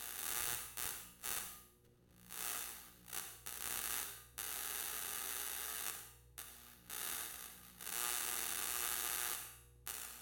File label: light flicker